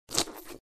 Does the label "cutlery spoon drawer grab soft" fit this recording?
No